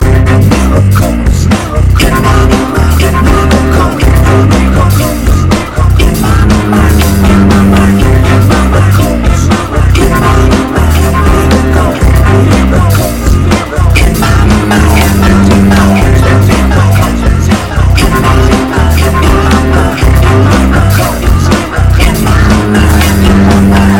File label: Is Calling Rock